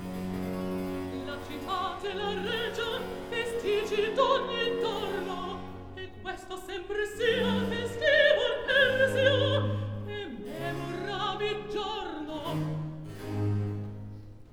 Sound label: Recitativo; Act Three, Scene 10; La cittade; Floridante